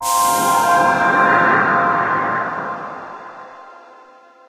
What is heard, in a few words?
startup; Source Engine; Portal 2